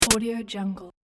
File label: retro collect ammo; magicsoundeffects